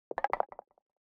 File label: chat response pending